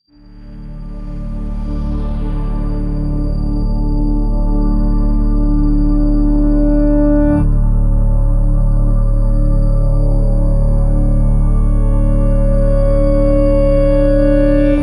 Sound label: Short D Swell